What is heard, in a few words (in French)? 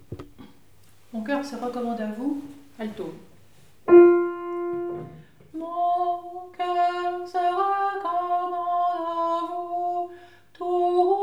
Alto; Mon coeur se recommande à vous